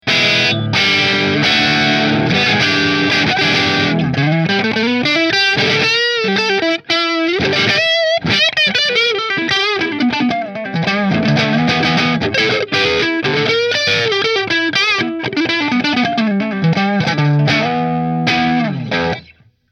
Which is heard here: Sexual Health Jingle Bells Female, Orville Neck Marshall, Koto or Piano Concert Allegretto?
Orville Neck Marshall